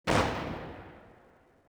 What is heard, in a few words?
explosion, battle background; colombia; ambience; Soldier Of Fortune II Double Helix; XBOX